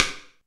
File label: SD RI, SD Rim; Sound & Vision - Gigapack I